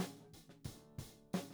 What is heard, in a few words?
Tom1